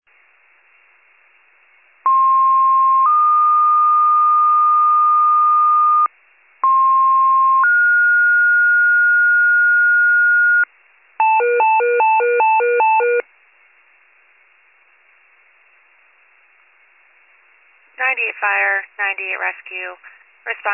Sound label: FIRE pager alert; 98 FIRE